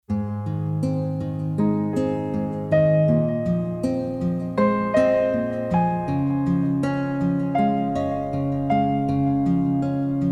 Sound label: Acoustic Guitar & Piano